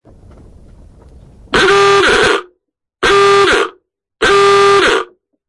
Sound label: Big Klaxon